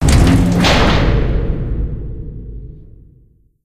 box door close; crazy; Source Engine; Portal 2

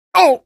pain, barney; hl2; Source engine

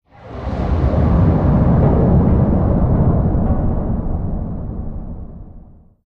spacebase rumble; ambient; Source Engine; tf

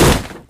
land; taunt; player; yeti standee; source engine; tf

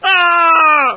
pain; Rosenberg; Source engine

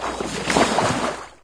underwater program error; windows 9x; sound schemes

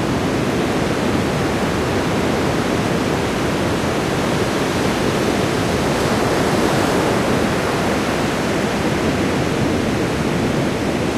perimeter ocean medium; Source Engine; tf; lair

